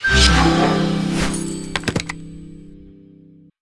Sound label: ui quest result